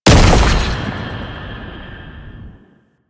Unyielding Gallop Hit; Warframe; Warframes; Dagath

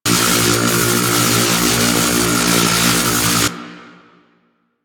Ground Laser Loop; Enemies; Abilities; Warframe; Teralyst; Bigalyst

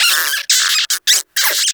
Forest Rodent Lure Call; Warframe; Hunting Critters

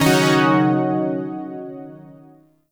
Shawzin Yo Chord L; Zariman Shawzin

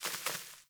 Sparrow Two Wing Flaps; Animals

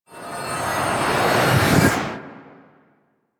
Kill Choice Zoom; Warframe; UI; Kuva Lich

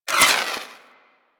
Wall Hand Slide; Footsteps; Warframe; Tenno; Wall Run

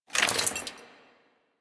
Generic Foley; Warframe; Dagath

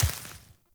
Short Sword Impact Vegetation; Weapon Impacts; Enemy; Swords; Sinecure Short Sword

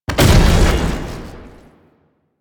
ram ability hit; Warframe; Lasrian; Lasrian Tank; Abilities

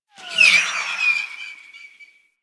Bow Projectile

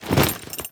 Generic Foley; Warframe; Warframes; Temple

